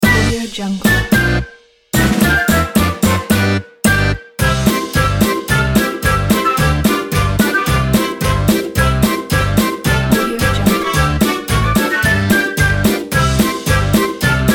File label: funny oktoberfest